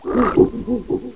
gurp

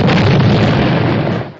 death; mpitstop

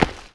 step; xatrix; gek

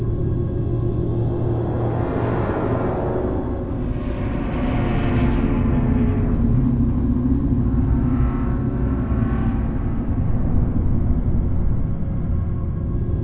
ambience

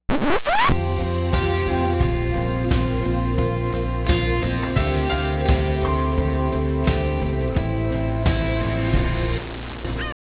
music bar

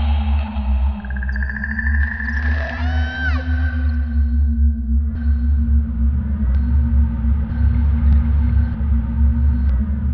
eerie

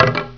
jump; vortex; barny

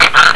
cluck; lmctf

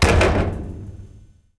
bang; baseq2; aggrocult

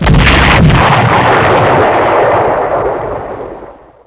explosion, beach; openffa

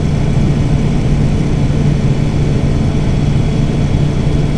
fan

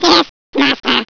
giggle

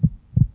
gurp; werewolf2

